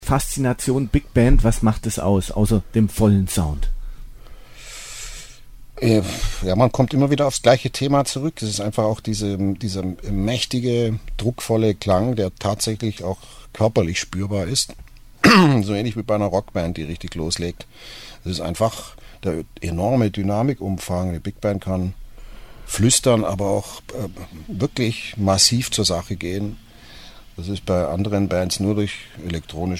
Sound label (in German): faszination bigband